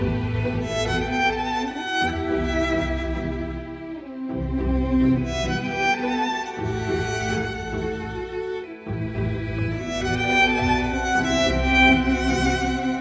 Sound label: String quartet sample